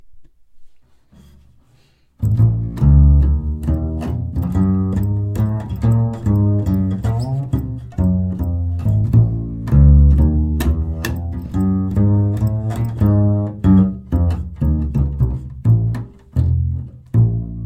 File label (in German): Kontrabass